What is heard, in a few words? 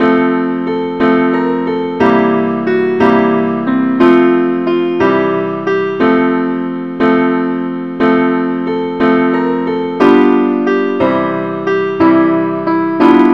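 angel voices simple accompaniment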